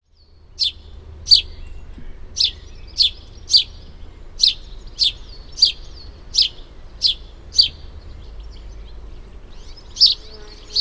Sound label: House Sparrow